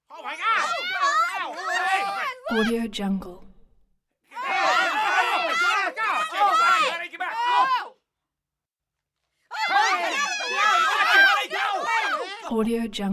crowd panic short bursts of small group; prosoundfx

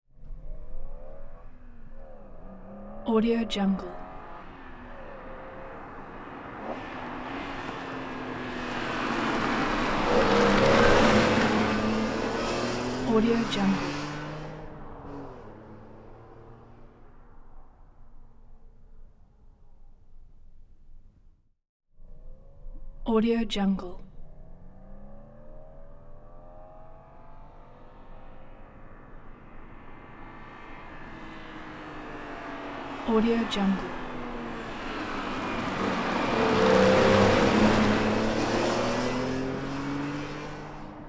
2002 bmw m3 car uphill turning pass by; prosoundfx

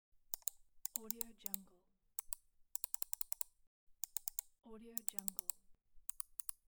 mouse click

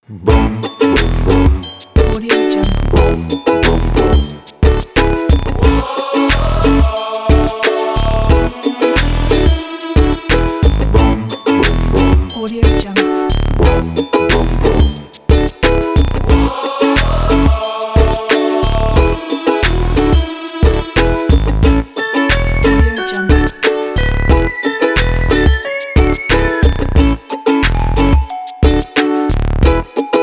lazy happy bom; anystyle

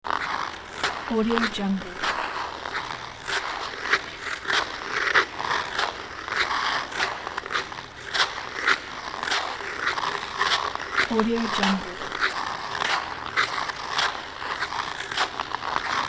steady forward ice skating; hollywoodedge

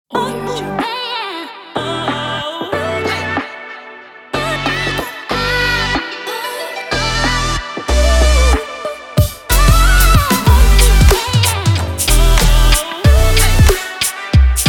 in hiphop

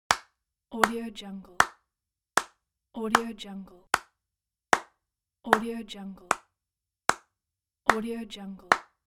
single person applauding slowly

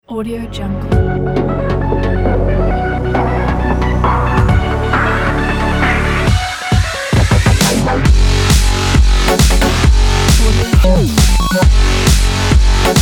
game mode

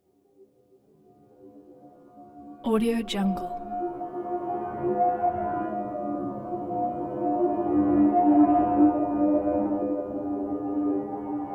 eerie tone metallic swirling tone tubular; prosoundfx

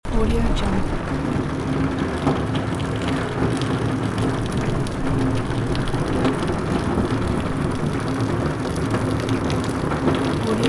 rain under leaking roof; fxprosound

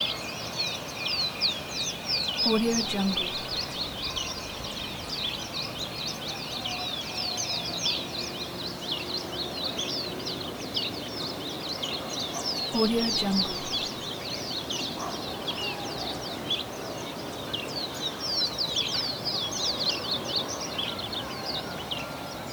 birds countryside ambience early morning

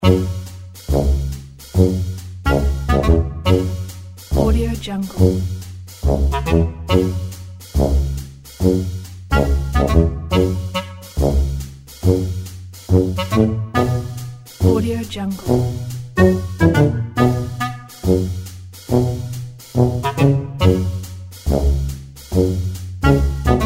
funny detective spying comedy

